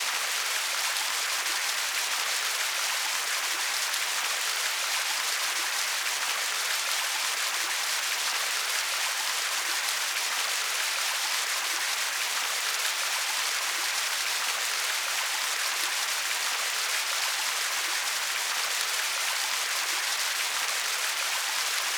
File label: ship engines; World Of Warships